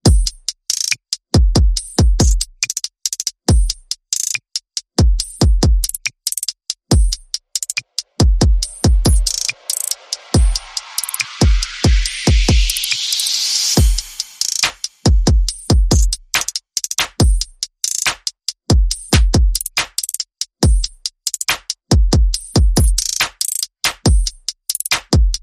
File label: Perc and FX Only